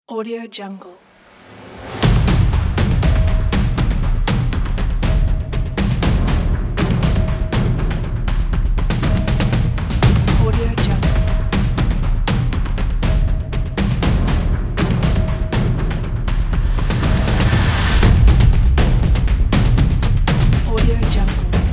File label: epic war drums